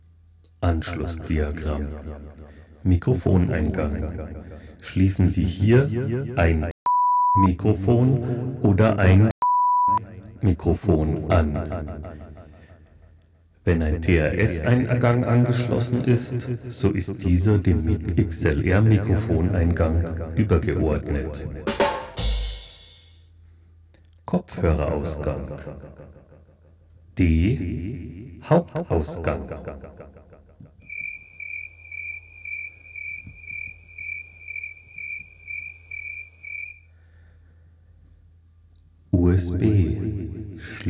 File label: Solo